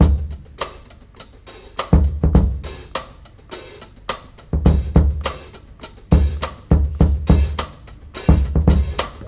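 104 bpm drum loop sample d key, kick tuned to the D note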